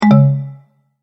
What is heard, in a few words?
multimedia tone error